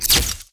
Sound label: win symbol Flash; netent